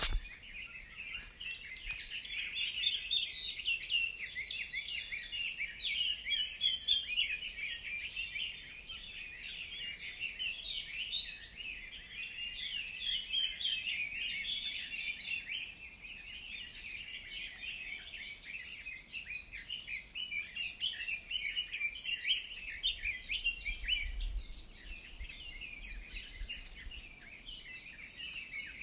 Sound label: morning birds